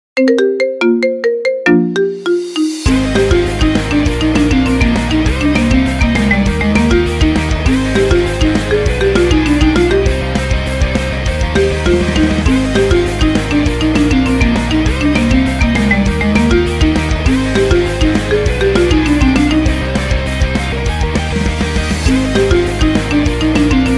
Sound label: Marimba; My Hero Academia